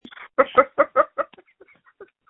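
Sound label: screams that feature laughter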